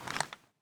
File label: aim in, weapons; STALKER; IWP